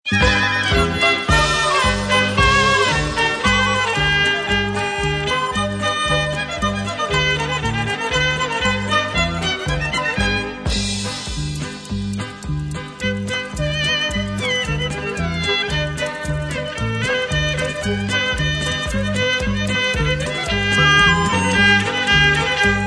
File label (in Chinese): 纯音乐